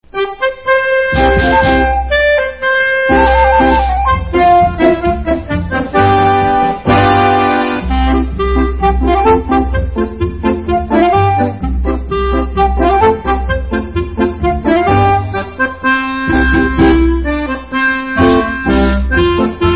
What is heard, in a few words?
Instrumental